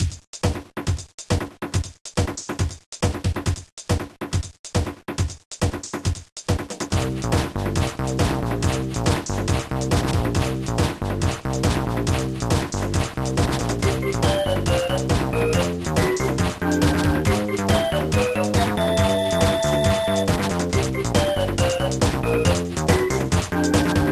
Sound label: Basic Training Music